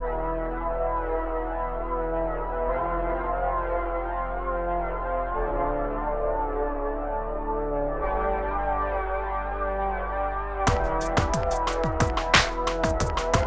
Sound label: pastoral tones